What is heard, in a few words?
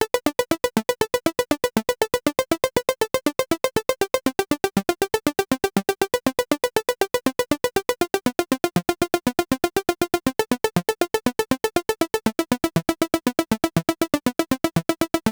Riff Dry Variation; Riff Teaser